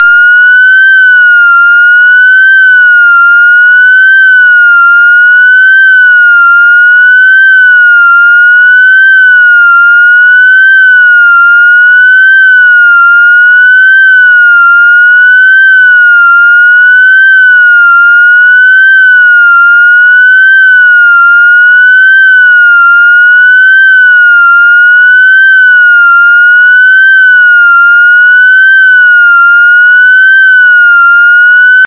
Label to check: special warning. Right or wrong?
Right